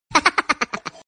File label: short laugh ringtone; minion